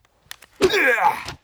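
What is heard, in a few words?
war attack, Mob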